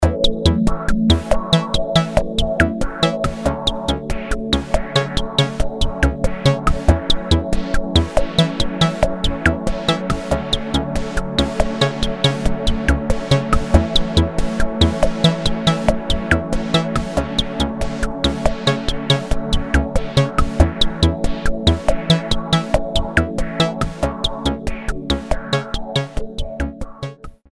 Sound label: bossa